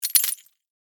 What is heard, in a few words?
Crash, Glass, Indicator, Lamp, Crack, Under, Pressure